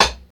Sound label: studio high hat sample a key, hihat sound tuned to the A note